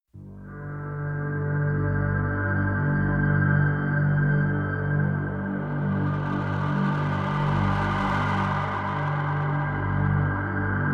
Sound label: drone